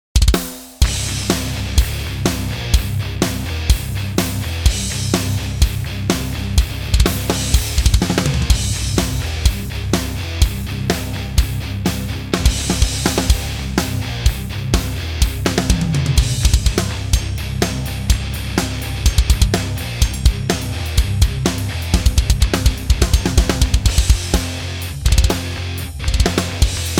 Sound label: Premier 22 Birch Kick Low; Drum Werks